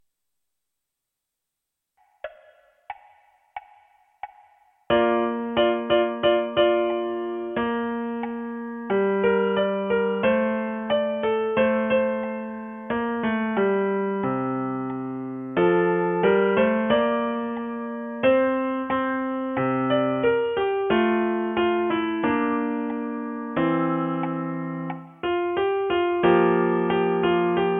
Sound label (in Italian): piano unite